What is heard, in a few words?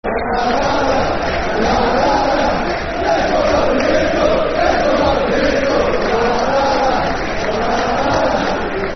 Football Chants (Soccer Songs), Cardiff City; fanchants-com